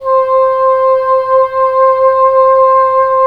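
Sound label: wind bassoon; LA Composer vol. 3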